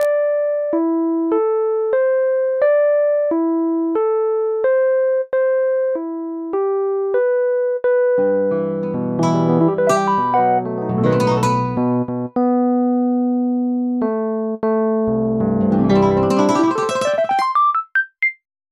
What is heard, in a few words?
two guitars